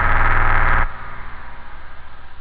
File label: robot, rave